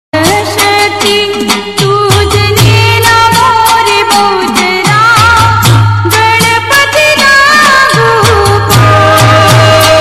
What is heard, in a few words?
Gujarati Garba